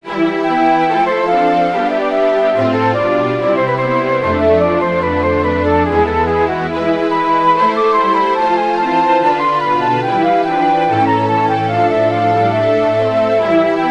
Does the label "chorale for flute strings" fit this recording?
Yes